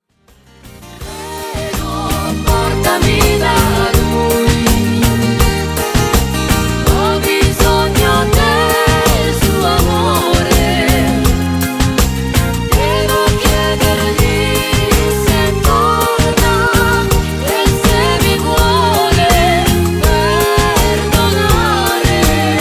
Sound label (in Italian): Cumbia Canto